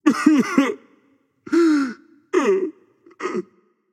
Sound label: boo hoo; smite; arthur